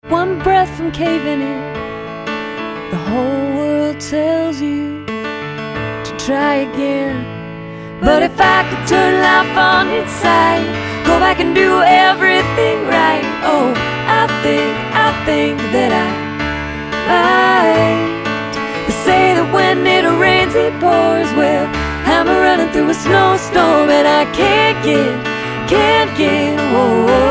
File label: snowstorm acoustic